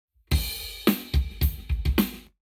Lab Wet Drums 0deg

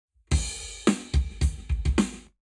Lab Wet Drums 0deg

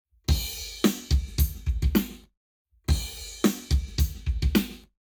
Lab Wet Drums 0 to 360deg

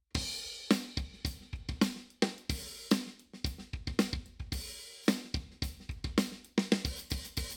drums binaural, big hall; tetramic

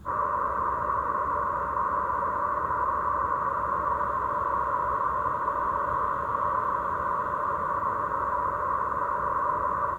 2x Noise AVAS, electric vehicle

